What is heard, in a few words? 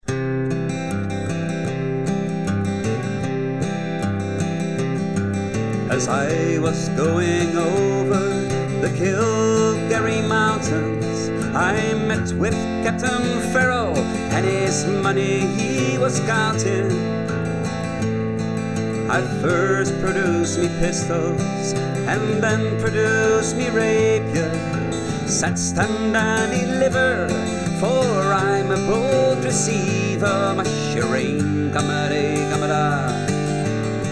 Whiskey in the Jar Irish Traditional